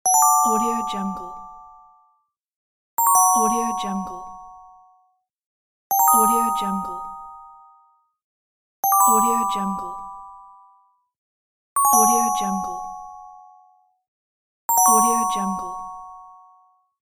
bright magical spell

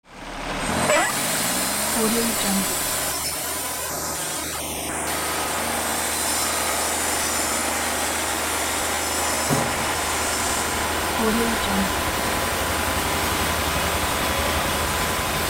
lumber mill ambience; mattpear

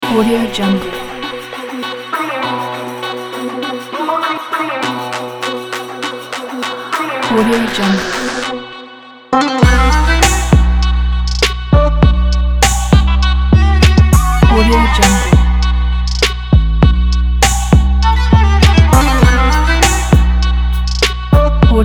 east vibe

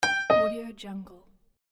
simple piano alert

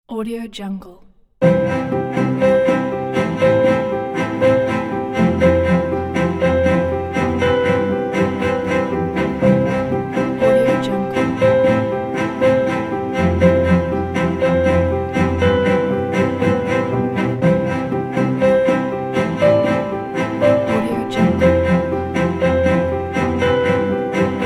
beautiful romantic inspiring piano

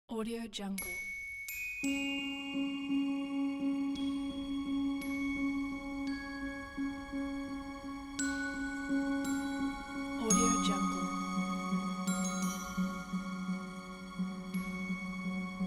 cinematic story

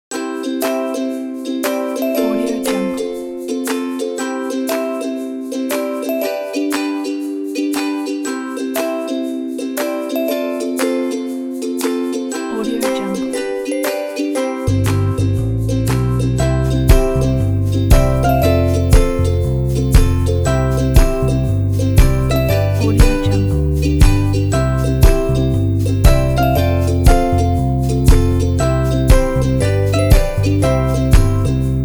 happy ukulele; orange-bee

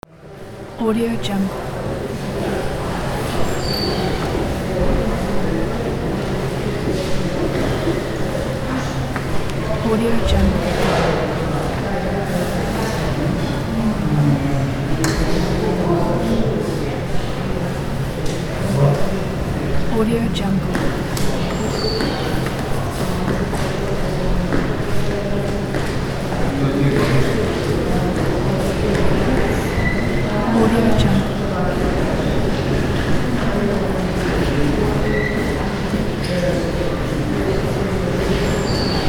crowd in hall

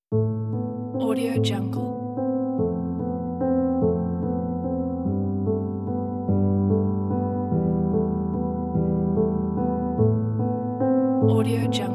melancholy; indeedaudio

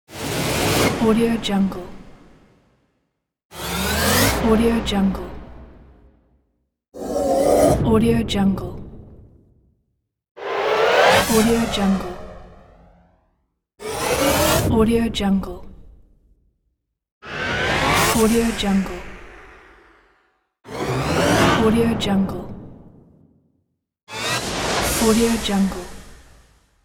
woosh pack

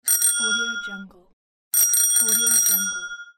bicycle bell

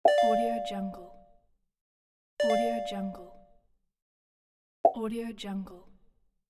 pop ding notification sound

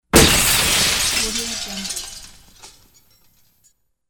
smash glass

